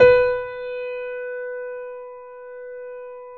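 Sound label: grand piano B 3; USB Soundscan; AKAI; Keyboards Acoustic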